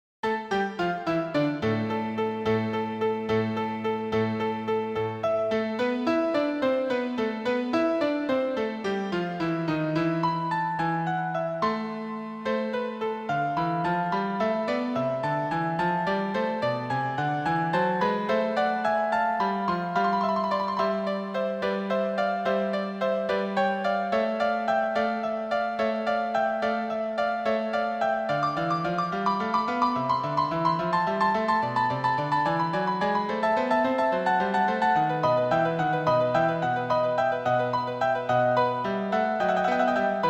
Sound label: sicut erat magnificat SSA, accompaniment track; niccola porpora; ed. hunter